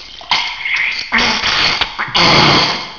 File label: squirt